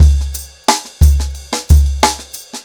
90BPM, ACOUSTIC DRUMS

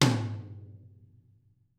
DW 12 HIT, BRUSH

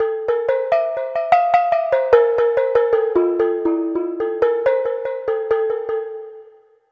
Pelog Gong Melody; Melodic; Ambient-Melodics-II

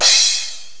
digi crash; Drum Hits; CYMBALS

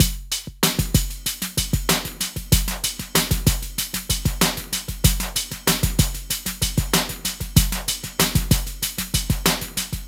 deep machine grotto groove; FRENETIC FRACTAL GROOVES

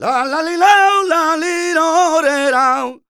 voc c, Flamenco Vocals Male Cantaor

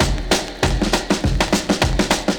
quite a beat

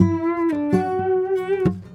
Sarangi, Acoustic String

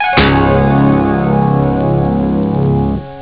start piano; Amiga Soundtracker sample

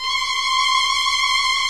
VIO MIN TRIL

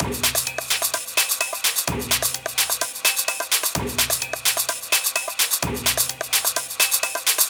Loops 128BPM, Drumloops

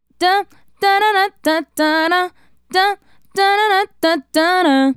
Female Solo, Hip-Hop and RnB Vocals

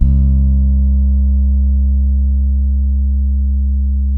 SAMBA, Bass

